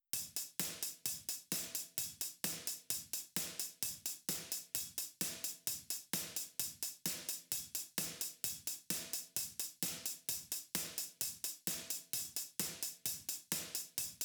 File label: Hihat